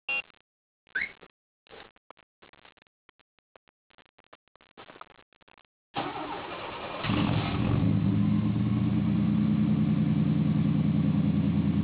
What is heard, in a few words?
remote start; All sounds were taken from a 8mm video camera, and lined-in to the computer